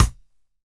TING LP; AMG Gota Yashiki Groove Activator; TING LOOP 74

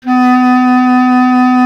B. Clarinet

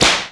WHIP; Big Fish Audio; Synth City

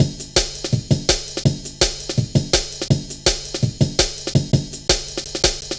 Drum Loops, 165.5; Zero-G Jungle Warfare 1, 2 & 3

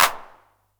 909 Clap Tape; Goldbaby Tape808 & Tape909; The Tape 909

